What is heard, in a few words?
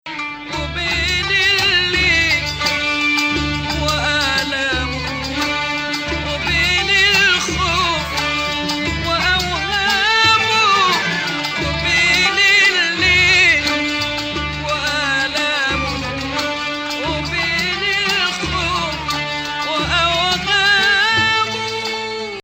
Bayati Rast